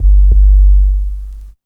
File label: BD, Dance Mega Drums, HIP